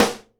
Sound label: snare GLASS, Live Snares